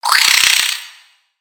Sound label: Spider Rage; creatures mechanical; Lego